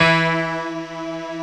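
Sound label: synth E, STAB, House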